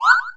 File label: Evade; Easyrpg; rtp2k3